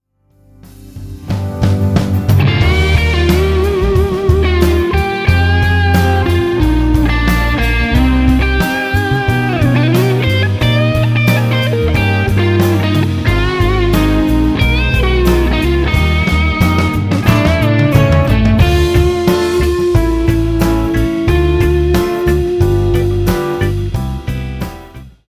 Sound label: Fender Marshall; Fender Custom Shop Stratocaster 56 Heavy relic